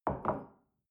shell place on table soft, gastronomie; berufe; soundpool

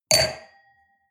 wine glass place table hard, gastronomie; berufe; soundpool

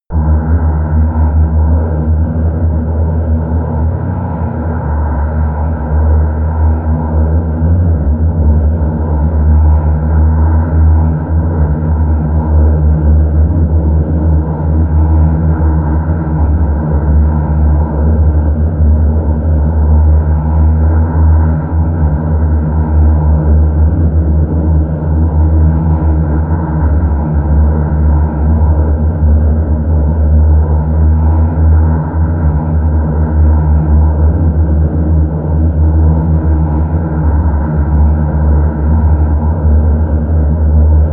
drone stealth high

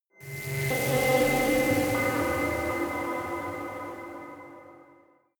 space unknown alienation call tension high; energie

